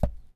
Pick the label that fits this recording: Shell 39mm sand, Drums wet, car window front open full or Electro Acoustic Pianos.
Shell 39mm sand